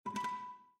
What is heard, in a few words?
Feed Discovered; Windows 7; Heritage